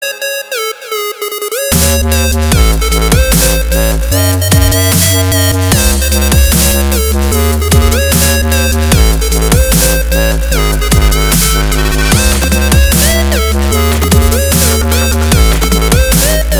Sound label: dubstep remix